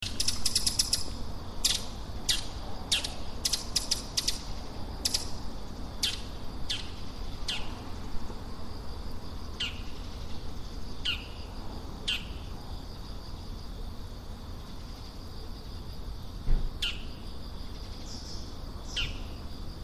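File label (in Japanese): mozu, bull-headed shrike; kubo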